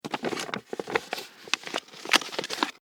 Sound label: Office Envelope Manila Open Clasp; Offices